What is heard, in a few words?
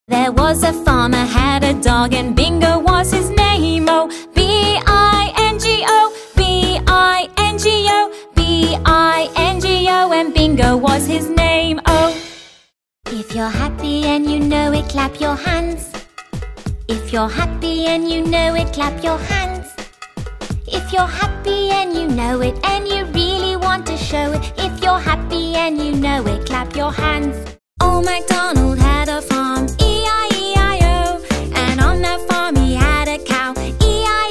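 British English, songs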